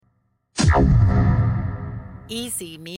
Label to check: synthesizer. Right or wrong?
Wrong